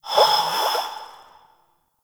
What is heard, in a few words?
Grineer Frogman Breathe; Warframe; Breathing